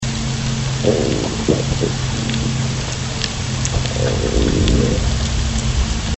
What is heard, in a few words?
minke whale calls pulsetrain